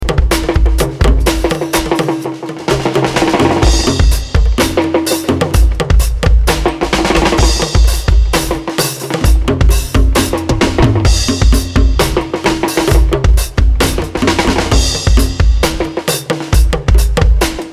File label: Drum Dub